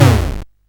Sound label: Dark Castle, CSG8580R5